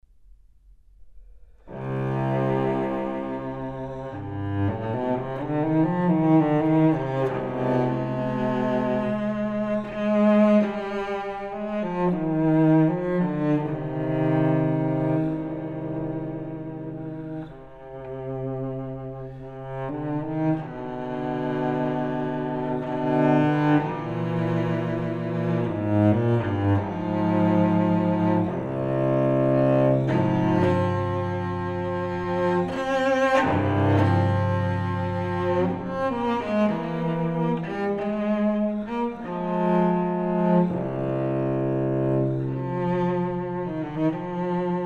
Suite for solo cello No. 5 in C m

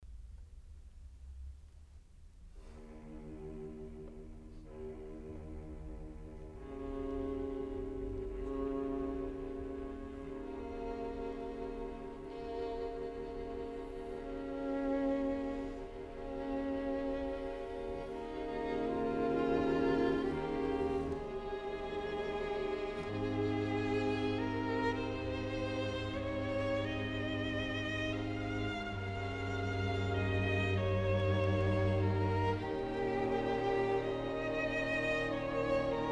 String Quartet in E flat

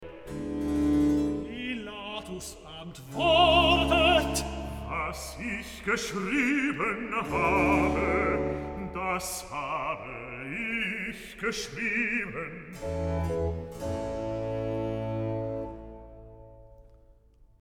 Recitative; Bach, J S; Johannes-Passion, BWV 245, Pt. 2; XXXI. Recitative. Pilatus antwortet

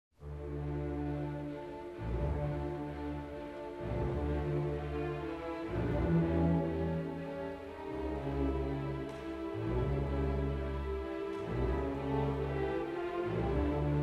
Piano Concerto No 20 in D minor; Wolfgang Amadeus Mozart; Piano Concertos Nos 20 & 24

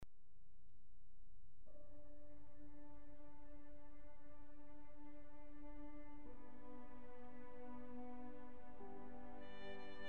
Nocturne & Tarantella for violin; Karol Szymanowski

